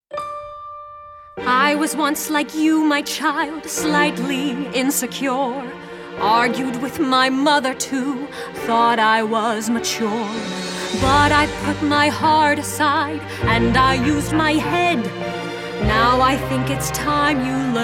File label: Evil Vocal; Descendants